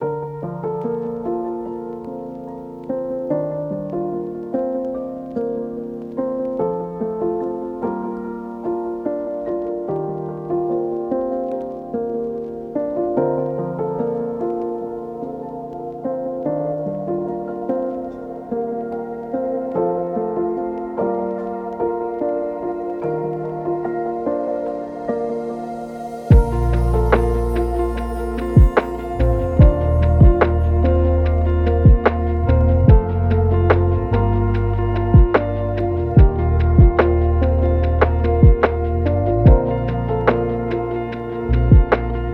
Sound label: lofi hip hop